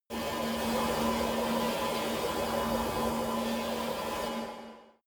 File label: space alien fabric working busy